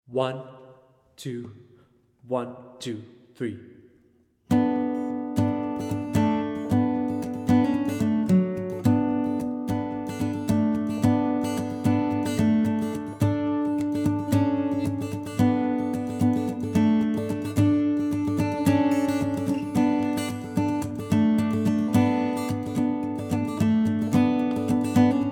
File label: Guitar Full Speed